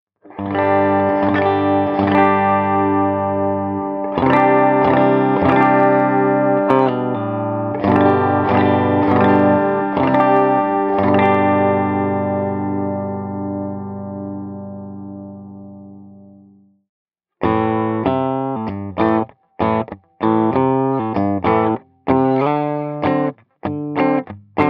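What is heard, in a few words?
bridge clean single, custom pickups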